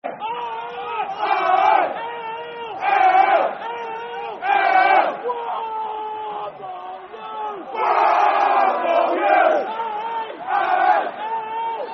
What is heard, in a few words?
Football Chant (Soccer Song); M.i.l.l.w.a.l.l.; Millwall Mfc